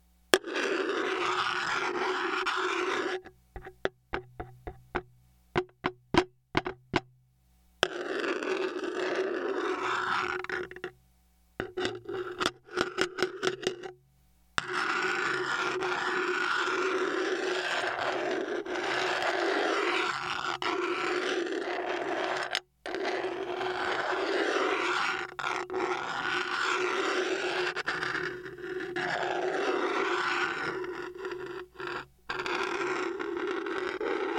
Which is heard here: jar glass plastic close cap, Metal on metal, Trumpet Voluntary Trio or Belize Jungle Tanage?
Metal on metal